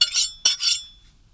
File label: Fork